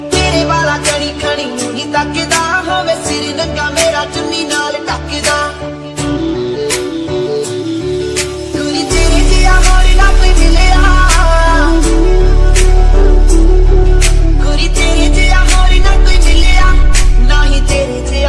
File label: remix punjabi english ringtone